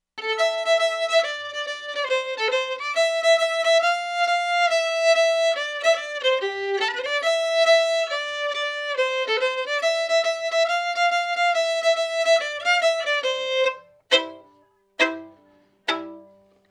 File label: fiddle clean; Dave Hill Designs